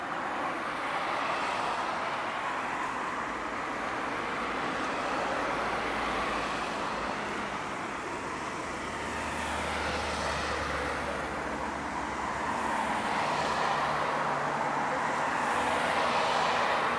auto

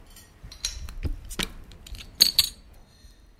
Flasche öffnen

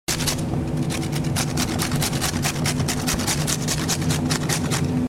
schnibbeln

